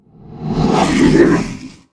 攻击, 鬼,幽灵; Enemy

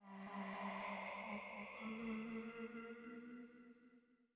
阴森的人声掠过; Environment

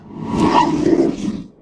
受伤, 鬼,幽灵; Enemy

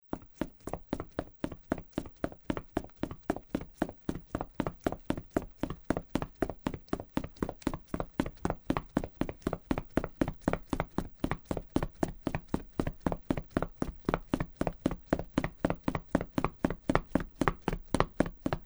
较硬的路面奔跑; Player

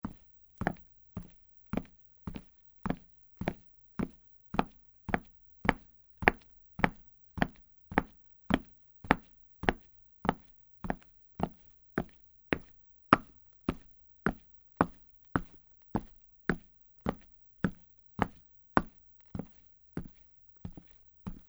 较硬的路面快步走 大声; Player

